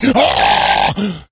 rage, infected, male

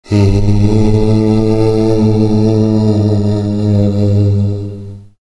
halloween moan

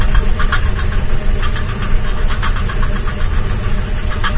amb paint shaker lp, industrial; video games; ambient

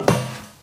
metal grate, footsteps

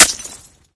knife hit; source engine; dod

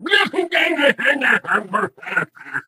ballbot laugh; player

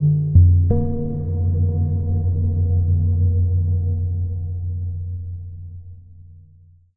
editor blips; Source Engine; Portal 2

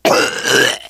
choke, biker; voice

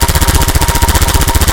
airboat gun loop; Source Engine; video game; weapons

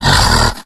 hulk breathe; Left 4 Dead; Source Engine; tank

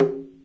jungle minimize; windows 9x; sound schemes

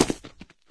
concrete, player footsteps

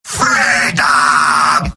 battlecry

